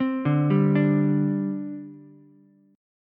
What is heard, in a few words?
D 7th Suspended 4th, down, Ukulele; arpeggiated; JGuitar